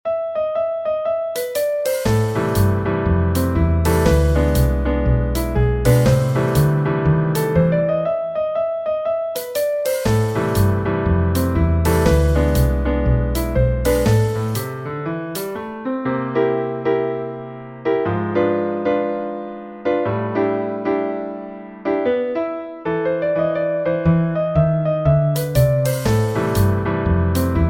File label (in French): Jazz pour Elise; Ludwig van Beethoven